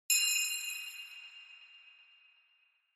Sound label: Bell Accent, Dry